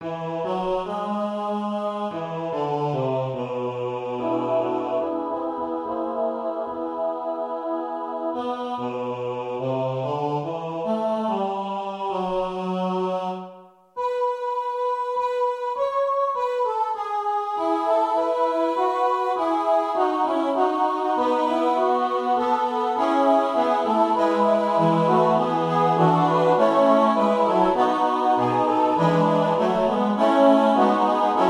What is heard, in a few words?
Dominus vobiscum Tenor